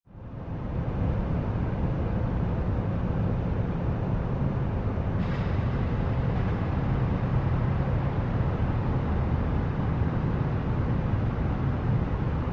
Spooky Alley Amb Big City

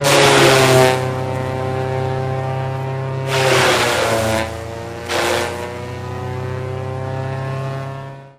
Mulcher Rips Wood, mulcher

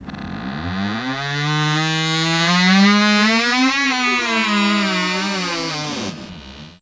Closet Door Creak Re

